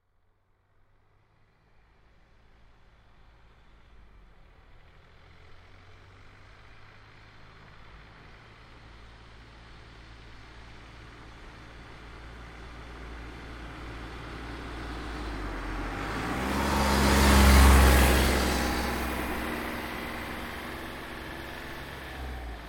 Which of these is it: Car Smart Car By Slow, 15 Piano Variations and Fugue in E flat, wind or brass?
Car Smart Car By Slow